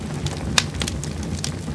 Fire; Knight Online

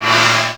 Player Shields

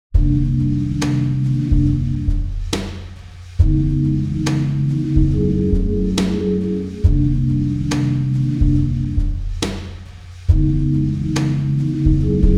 Casual Background; silent hill 3

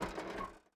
Mech Footstep Texture Metal; starbites

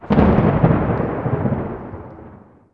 Thunder

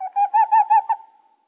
AMB China 3D Amb; rabbids multiverse; iOS, macOS, Mobile

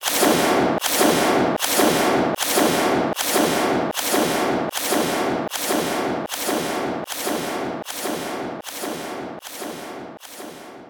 Card Pack; gamerip

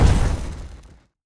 Impact Fire; Legend of Keepers (gamerip) (2019)

